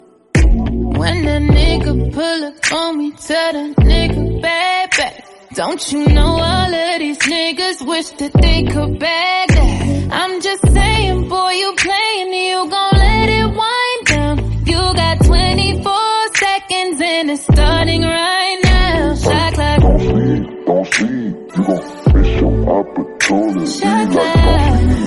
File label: english song ringtone